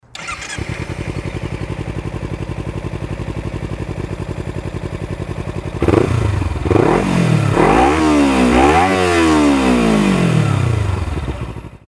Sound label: ninja250 lci